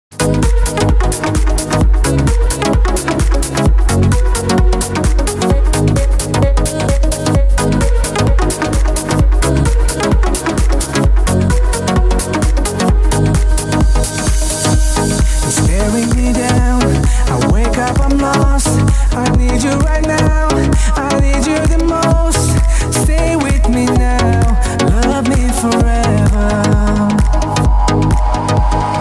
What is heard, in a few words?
Slow Motion Remix